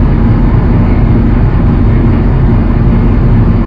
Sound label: cooling run loud, ambient; STALKER Shadow of Chernobyl